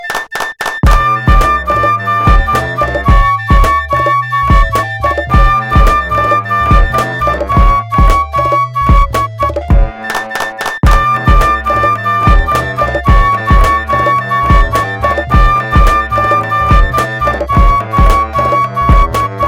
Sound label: Mystic Flute Hip Hop